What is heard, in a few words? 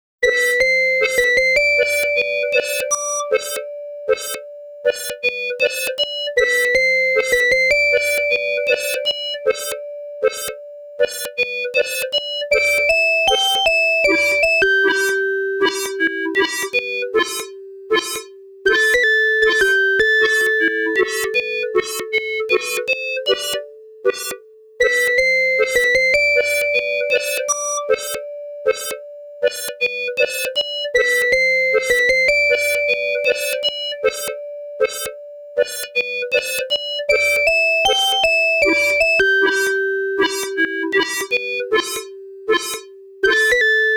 All Clear; Toaplan Arcade Sound Digital Collection Vol.05